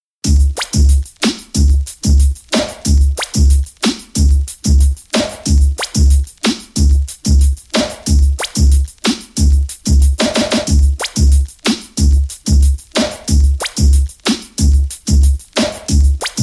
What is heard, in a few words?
80'S Reggae Mix